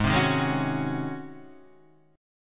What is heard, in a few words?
C sharp Major 7th Suspended 2nd Suspended 4th G sharp